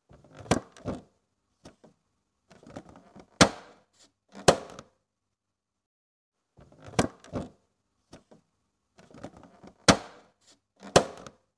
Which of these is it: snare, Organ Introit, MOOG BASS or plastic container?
plastic container